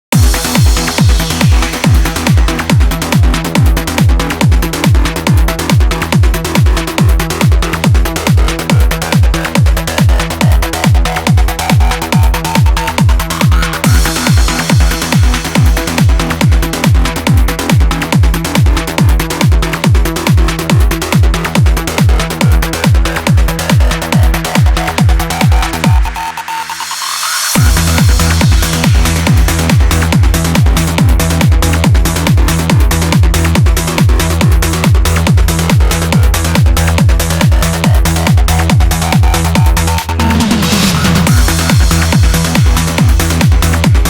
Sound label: Trance VIP